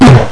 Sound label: bump; opentdm; cyberdemon